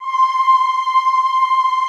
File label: OHH C5, Classical Choir; Best Service; Extended Classical Choir